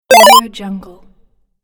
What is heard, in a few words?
collect 8bit